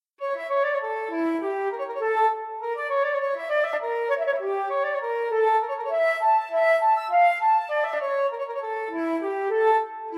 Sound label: Pastorale for Solo Flute